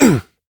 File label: Pain, Adult Operator; New War